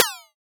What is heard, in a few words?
Alien FM Perc Short